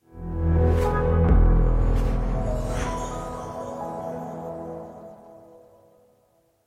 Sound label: Completed; Rocksmith